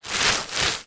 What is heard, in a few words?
paper wrapping sheet hand rub over; foley; zapsplat